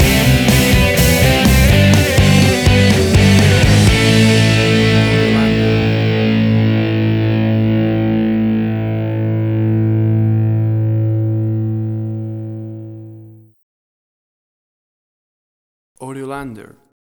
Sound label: Big Rock Stinger